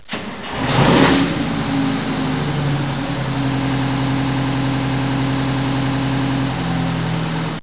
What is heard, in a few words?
start